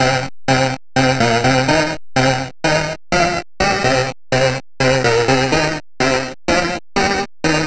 сэмпл звукового эффекта dance electro; Dead Bits

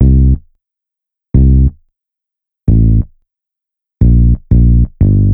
Amped, бас сэмпл хип хоп

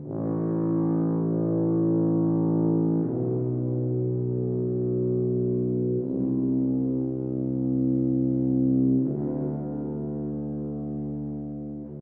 Tuba, духовые сэмплы movie score туба

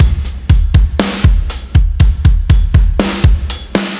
Poisonous, ударные и барабаны rock alternative

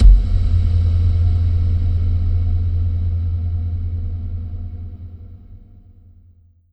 Hit, техно транс

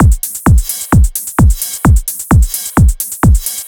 Beatnik, сэмпл ударных евродэнс

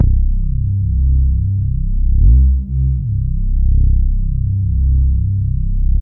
Grounding, бас сэмпл эмбиент

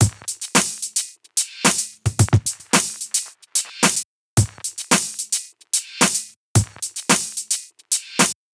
On Beat, ударные и барабаны rnb soul

